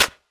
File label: studio hand clap sample b key; single hits one shots